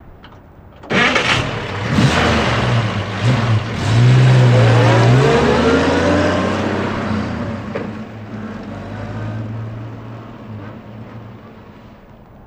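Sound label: Chevy Truck 46 Start Away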